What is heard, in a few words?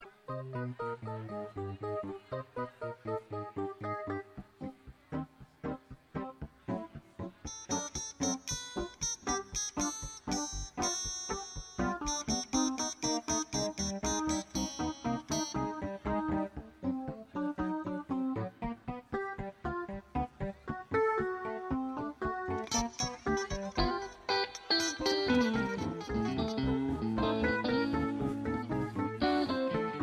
tuning